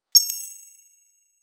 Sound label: a short bouncy and slight, coin